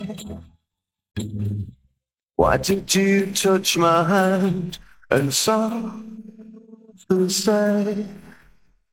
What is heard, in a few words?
Hand Vocal